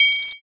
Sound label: vs card